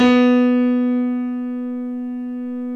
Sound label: WARM PIANO